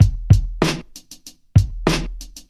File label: 129 bpm 2000s breakbeat g key, Free drum beat - kick tuned to the G note. Loudest frequency: 631Hz